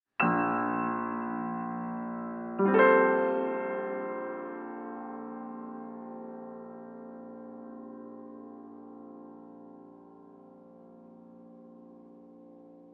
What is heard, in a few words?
tune